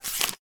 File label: household cd or dvd in paper wallet slide in between others